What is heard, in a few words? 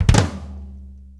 6-8 Kit Flam, Drum Loops; Sonic Foundry (Sony Creative Software); Loops & Samples Drum Tools; Flams